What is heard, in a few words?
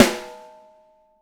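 6X12 ALUMINUM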